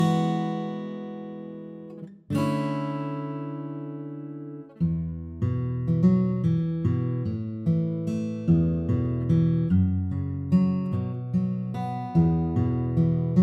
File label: guitar; Roblox Islands